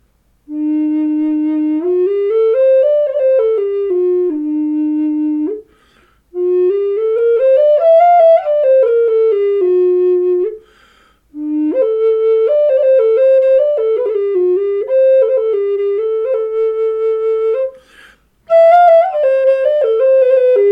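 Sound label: E4 a432 Flame Box Elder, Native Style Flutes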